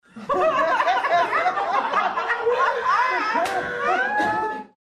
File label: Small laughing audience